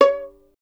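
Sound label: STR violin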